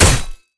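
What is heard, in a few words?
damage bow plate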